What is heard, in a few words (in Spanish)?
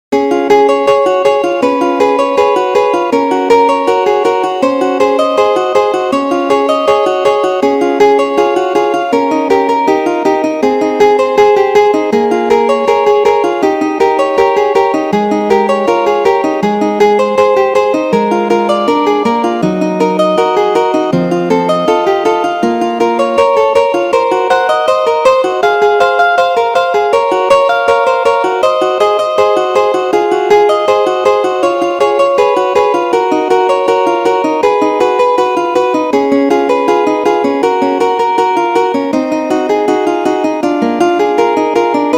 clave; bach johann sebastian; bwv0872; bien temperado 1 preludio